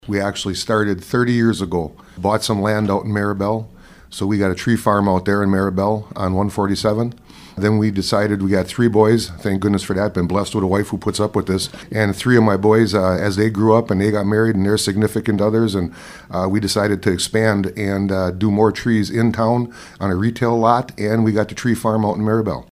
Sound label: Drum